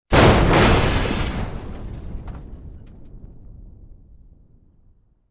Explosion Metal Deb, metal & glass

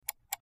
Highpitched Mouse, mouse

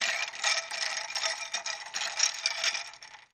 Ice Stir Ice Water

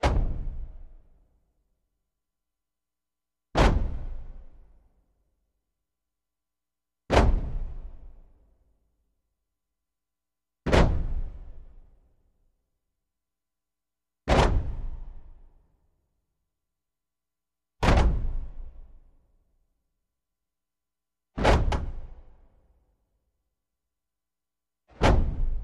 Staff Hit Multiple

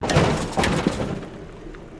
Vehicle Suspension, hits & rattles

